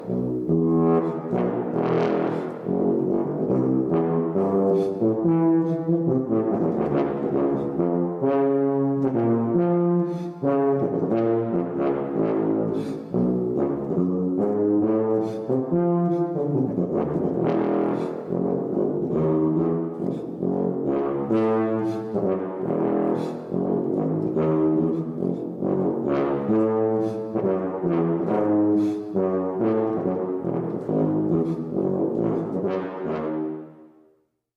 Tuba

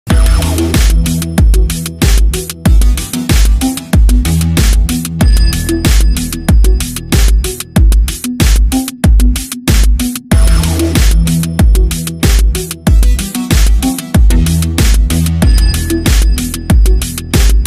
neon city outrun synthwave animation loop

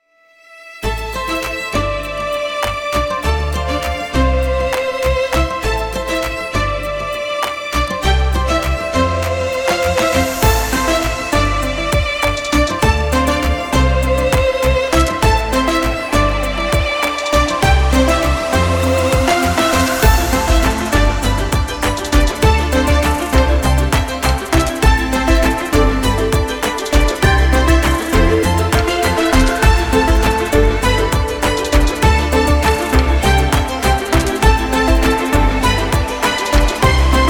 inspiring happy violins

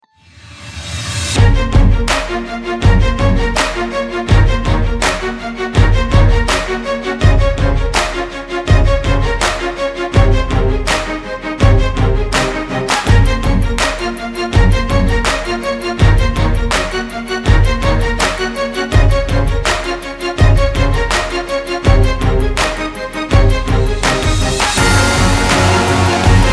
epic

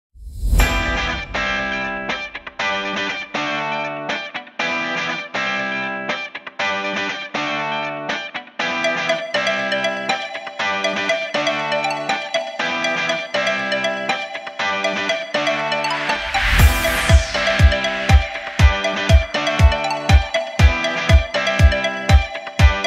Happy and Fun Pop Background Music; For Video

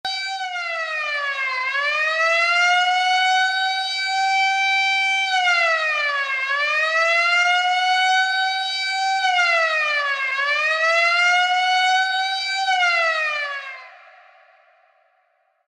Prison Siren

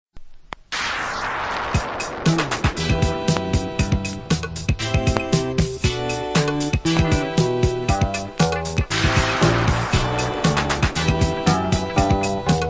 Obscure Afro, Nigeria; Funk Inconnu

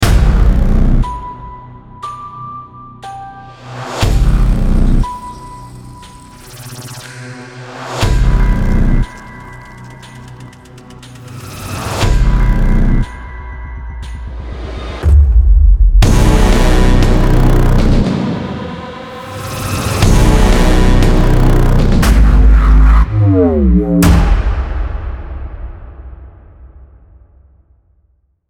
cinematic trailer

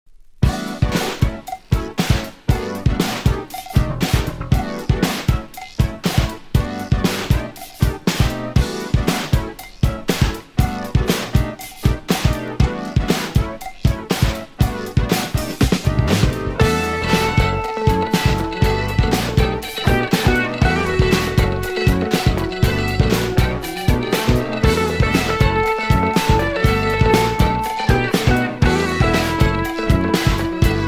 Funk, Boogie

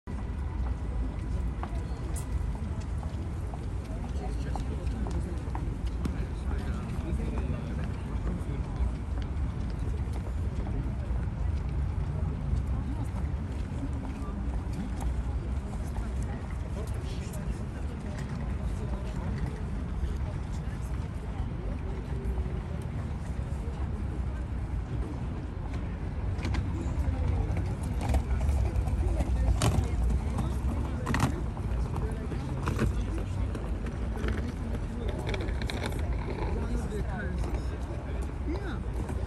street ambience

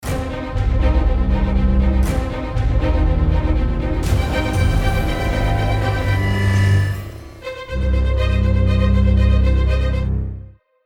dramatic reveal

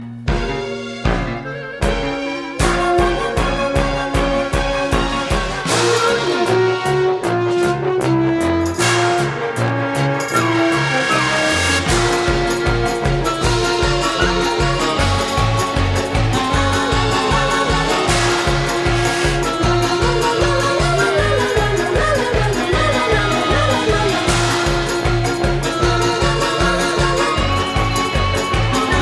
ringtones; series tv; beetlejuice

